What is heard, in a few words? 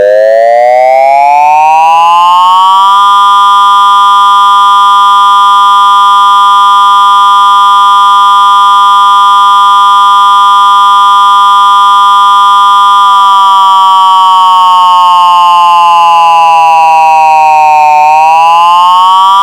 Long Wail